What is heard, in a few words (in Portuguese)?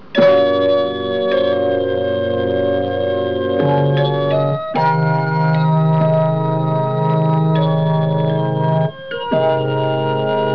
orgao